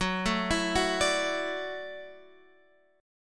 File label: Gb Minor 7th Sharp 5th, Guitar Drop D